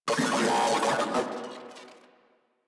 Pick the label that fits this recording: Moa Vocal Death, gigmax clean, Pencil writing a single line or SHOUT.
Moa Vocal Death